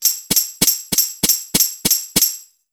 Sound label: DRMS, Underground Hip Hop; USB Soundscan vol.02; AKAI